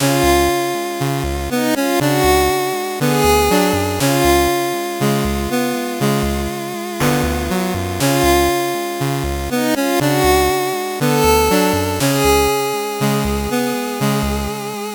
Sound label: 8bit bgm normal